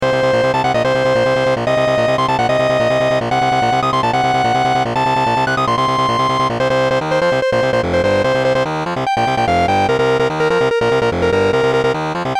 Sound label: Boss Battle; Mega Man 86; TI-86